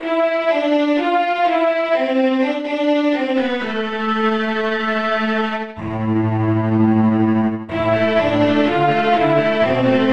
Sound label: tremolo